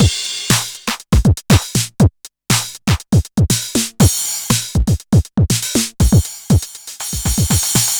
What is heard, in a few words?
909 TINY; Wizoo - Platinum 24 Electronic; DEMO KIT